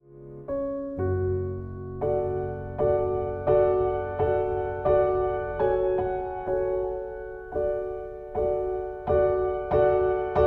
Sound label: cadences